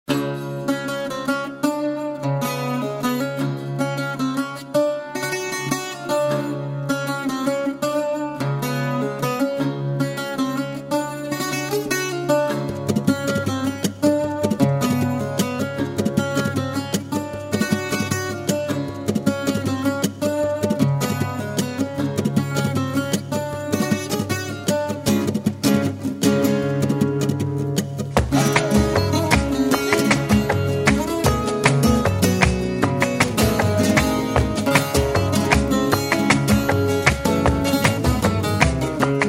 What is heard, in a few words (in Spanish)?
falseta tangos